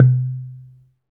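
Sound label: percussion, log drums, African; Roland LCDP11 Africa VOL-1; Wood & Metal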